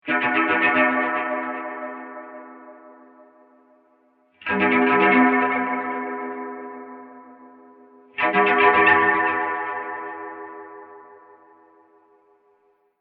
Impact Metallic Horror SciFi Echoes, Impact Metallic Echoes Slow Motion Stutter Slow; Stutter Fast; Hit; Hits (Sound Effects:Horror)